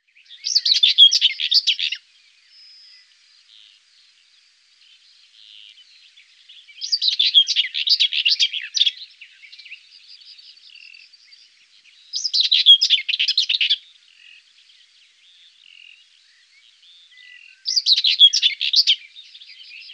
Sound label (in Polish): Cierniowka, Ptaki Glosy